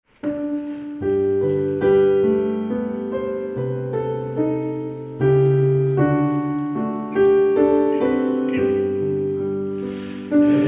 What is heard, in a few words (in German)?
Liederkranz; Belsen